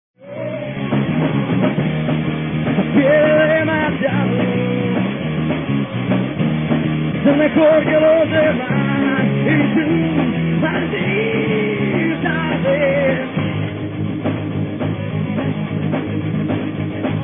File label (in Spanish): solo